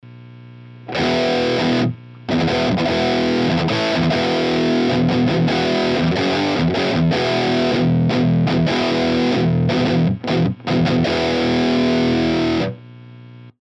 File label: Treadplate Cab